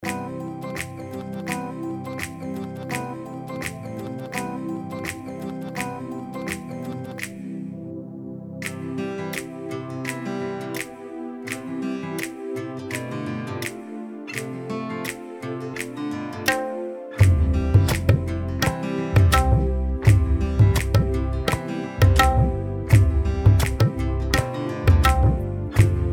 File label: Karaoke Song; mismatched season 3